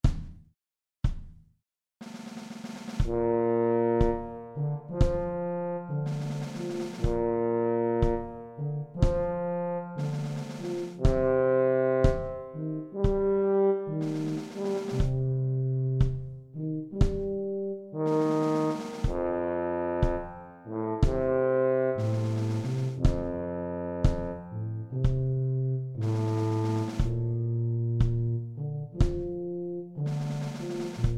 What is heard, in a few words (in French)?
tuba batterie